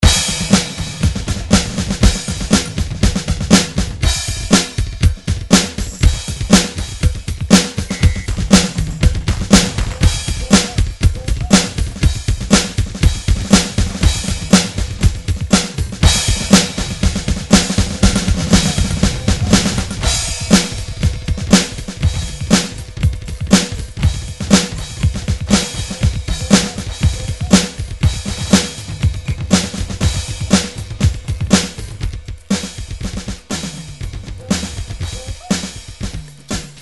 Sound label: drum grooves midi loops rock; Steinberg Nice Beats 1000